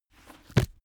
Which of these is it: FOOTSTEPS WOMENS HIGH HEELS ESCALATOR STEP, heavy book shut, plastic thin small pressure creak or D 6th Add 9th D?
heavy book shut